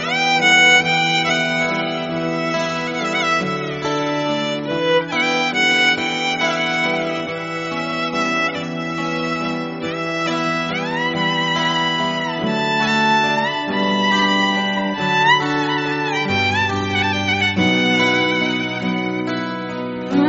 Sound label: very sad violin for cellphones; chithram